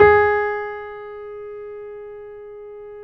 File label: BOSENDORF